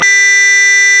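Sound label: Guitar Harmonics Eb3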